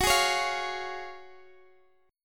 Gb Major 7th Suspended 2nd, down, Guitar, Standard; strummed; JGuitar